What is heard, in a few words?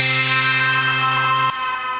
Synth Keys; Linear Clouds